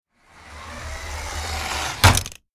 RC toy car remote controlled impact; rc toys

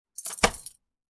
Door unlocking with keys jingling

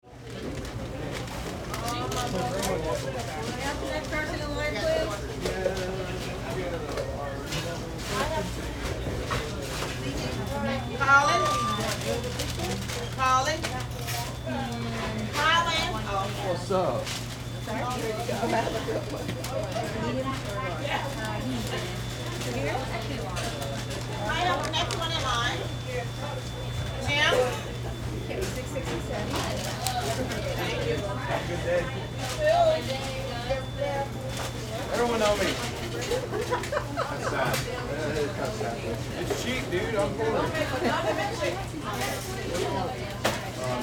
Fast food restaurant ambience California

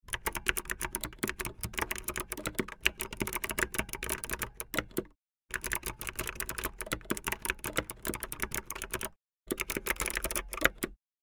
Optical keyboard typing fast

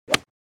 Golf ultralight 7 Iron swinging with impact

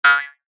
Cartoon spring boing jaw harp

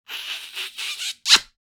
Old man long kissing with smooch

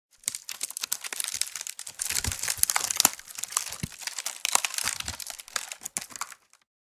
Hamper lid push creak and rustle; basket

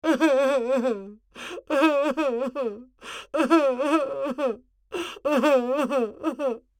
Senior lady crying desperately

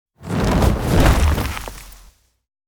Pterodactyl dinosaur flying land

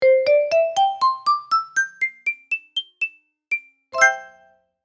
Marimba win success level complete mobile game

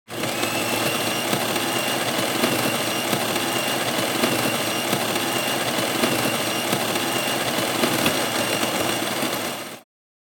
Coffee grinder noise; appliances

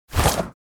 Vampire supernatural speed movement

